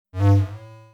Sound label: ufo; basic games; SpaceHunting